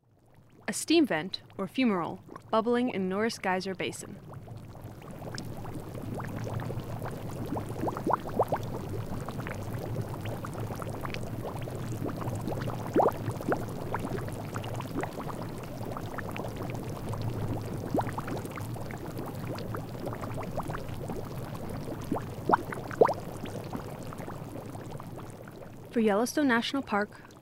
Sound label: fumarole; sound and light ecology team